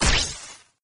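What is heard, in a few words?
chain lightning sound; Arc of Templar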